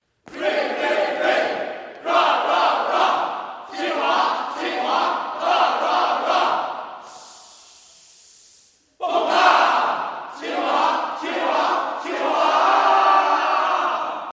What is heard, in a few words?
yells; nthu